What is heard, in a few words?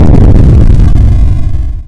cannon explo